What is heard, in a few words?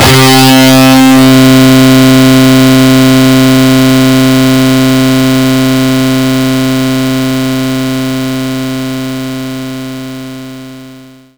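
Distorted Guitar Low C3; knights try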